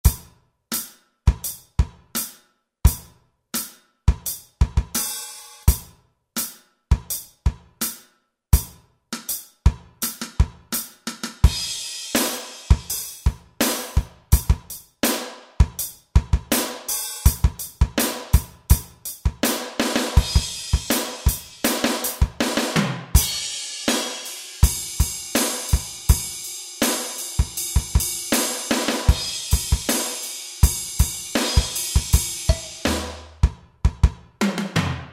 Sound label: West Room Kit; Kurzweil K2000 V3